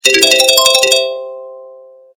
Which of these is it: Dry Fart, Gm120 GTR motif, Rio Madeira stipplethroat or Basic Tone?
Basic Tone